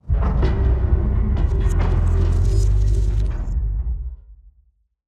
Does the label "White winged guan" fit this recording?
No